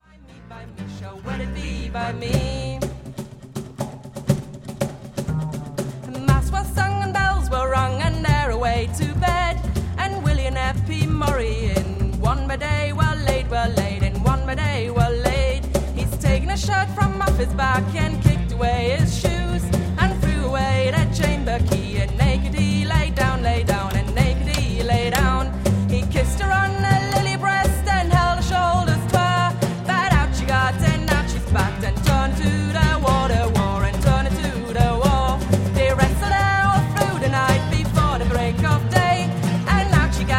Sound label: Irish Folk, Fiddle